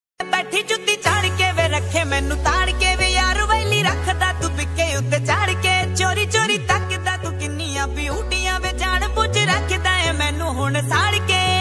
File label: punjabi ringtone